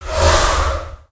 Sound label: Spider Boss Jump Down; creatures mechanical